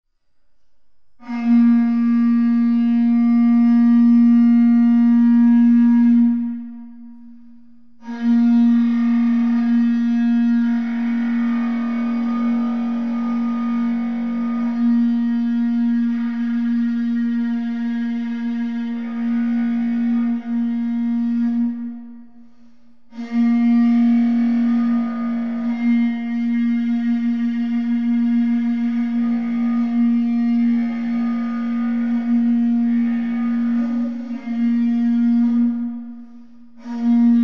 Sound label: large horn; ancient instruments; music